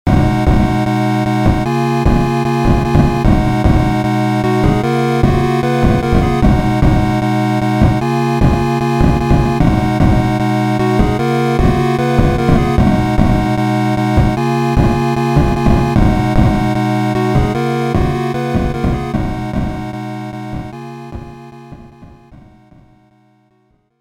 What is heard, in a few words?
Beepbox